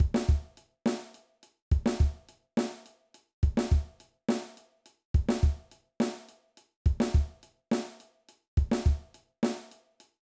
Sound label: Groove 68 Drum Beats